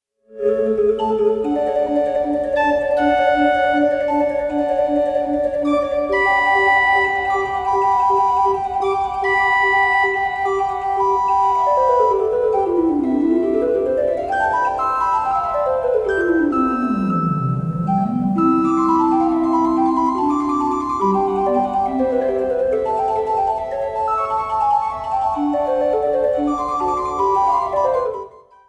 capriccioso for keyboard; capriccioso pra il cucu

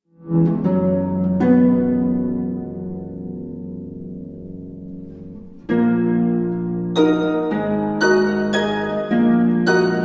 for treble voices and harp interlude harp solo; benjamin britten; a ceremony of carols op 28

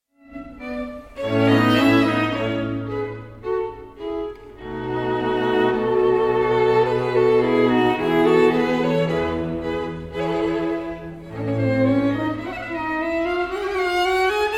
string quartet in D K155; Wolfgang Amadeus Mozart

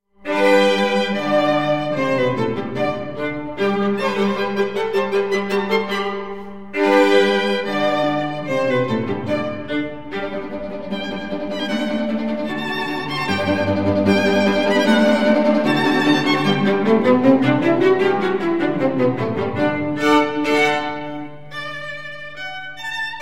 string quartet no 1 k80; wolfgang amadeus mozart

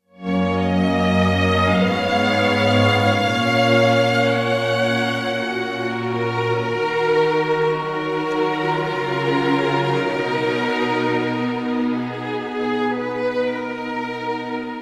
violin concert; max bruch; bbc national orchestra of wales; nicholas collon; violin concerto no 1 in g min

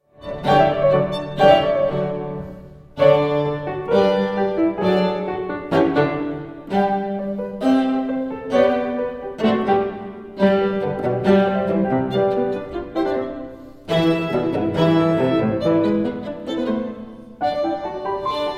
piano trio; Wolfgang Amadeus Mozart; piano trio in G K11

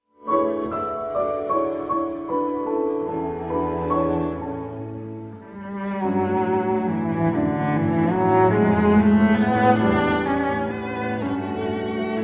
string quartet, quintet in D major; Piers Lane; Anton Stepanovich Arensky; Goldner String Quartet

